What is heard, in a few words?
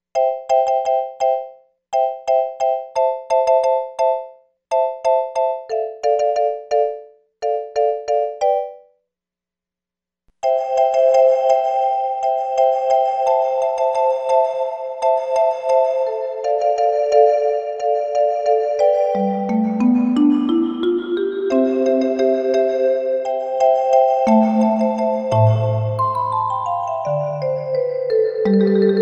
CONCRETE PIPE